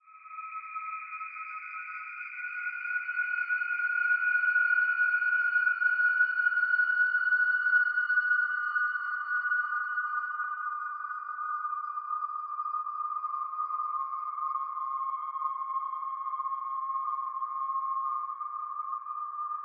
Sci Fi Atmos Space Voyag, ambience

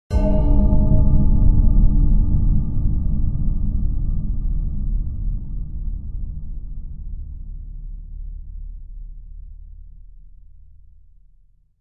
Bell Chime Lfe Reverb

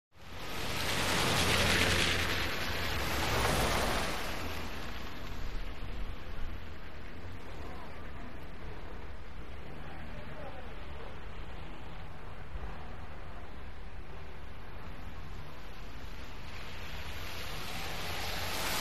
Traffic Bys Away, wet traffic

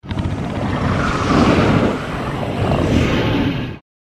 Monster Combine, monsters

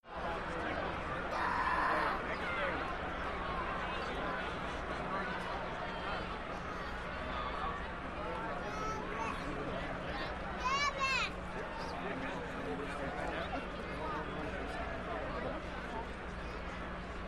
Arena Crowd Idle Ohs, sports arena

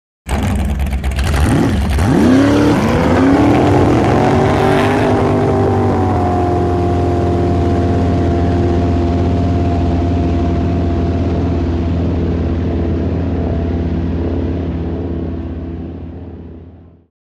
Drag Boat Start revs

